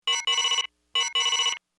alert, computer

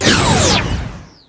phaser rifle

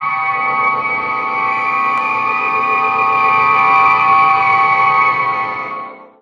transporter

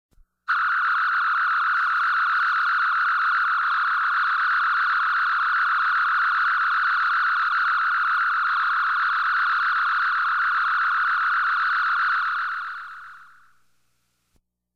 phaser

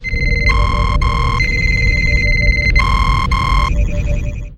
ambient bridge, computer

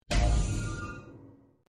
force field on

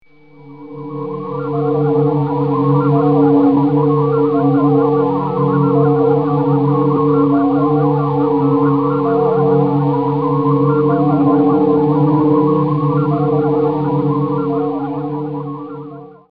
shuttlecraft interior; background; TOS

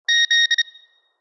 hull hit

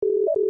computer beep

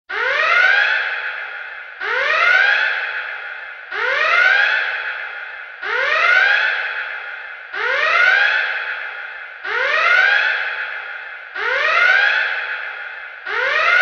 red alert shuttlebay; TOS

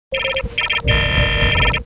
deny beep, computer

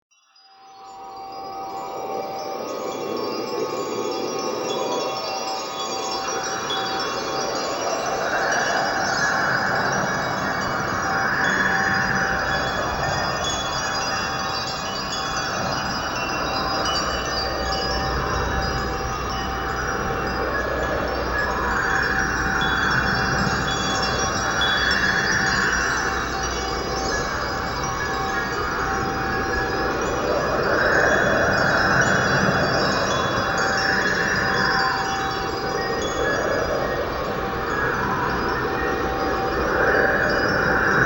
planet chimes; background; TOS

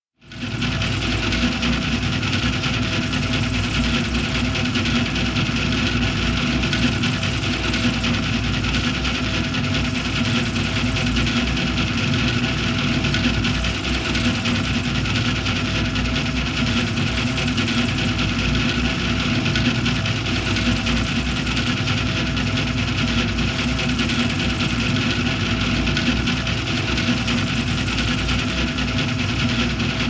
computer room; TOS

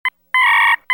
computer beep; klingon

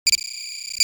padd

